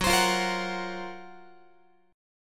F sharp Major Sharp 11th F sharp, Guitar; F#M#11 Chord; strummed; x,14,15,15,14,17

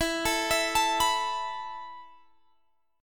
E Suspended 4th E, down, Guitar, Standard; arpeggiated; capo=3

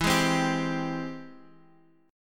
G sharp Augmented Major 7th E

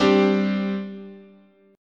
G Suspended 4th, chord, guitar

